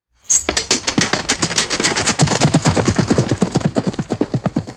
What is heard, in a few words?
horses galloping away from starting gate; ambience